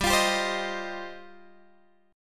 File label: G 7th Suspended 4th Sharp 5th G, Guitar Open G